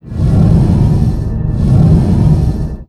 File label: big fan slow; FlyForInsanity